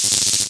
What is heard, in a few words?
fence zap; ragemap2023